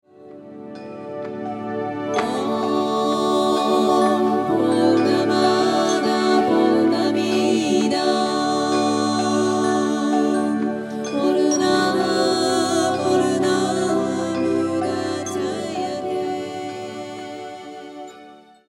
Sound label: Shanti Mantra